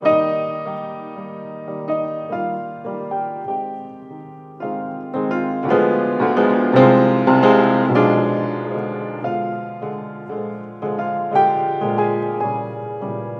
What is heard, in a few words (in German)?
fortgeschritten piano; musikschule; instrumentalunterricht